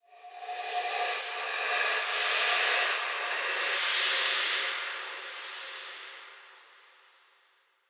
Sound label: Spirit Fly, Ambience; Soul Plane; Orlick